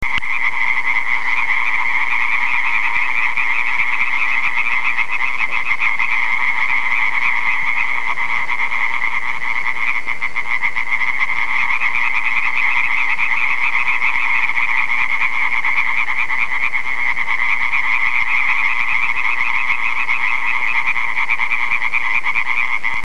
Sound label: hyla